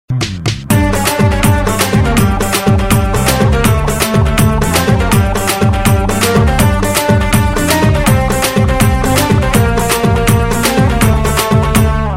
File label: cat pop n hiphop mix; animals